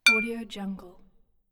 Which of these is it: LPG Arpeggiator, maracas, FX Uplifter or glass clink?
glass clink